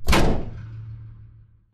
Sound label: sliding door ext verb close start; Titanfall 2